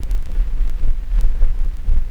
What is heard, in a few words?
NOISE, Drum & Bass; Ueberschall; Drum & Bass Resonance; 168 BPM